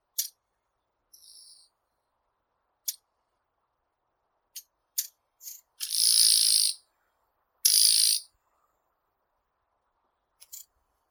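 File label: blyth's reed warbler, acrocephalus dumetorum; kamishovka sadovaya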